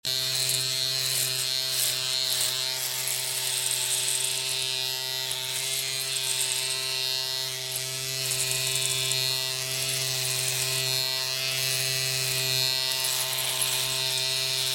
Electric Shaver

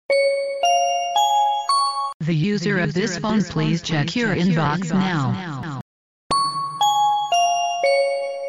New Airport Msg

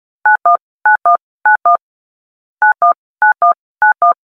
Dual Sms

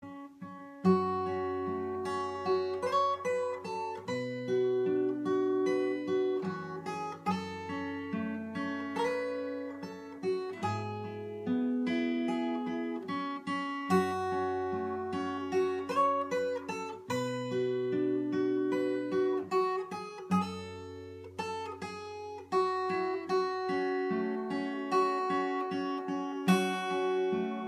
theme guitar; Tapion

